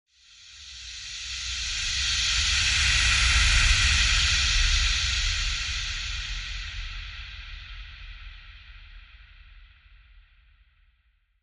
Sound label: Hushed Traveler Quiet Whoosh